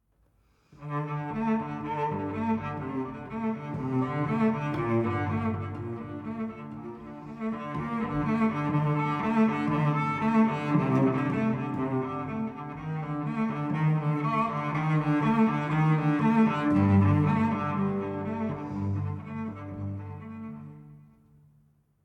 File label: Savannah Music